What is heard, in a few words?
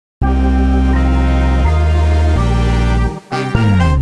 Disco Intro; Imagine Modern Dancer; Nintendo DS